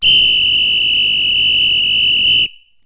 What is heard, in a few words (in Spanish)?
silbato largo; primartis; sonidos